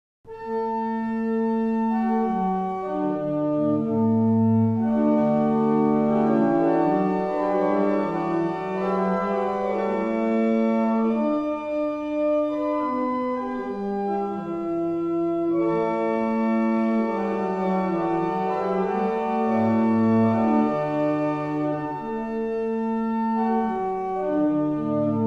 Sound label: Double chant, barrel organ; Avington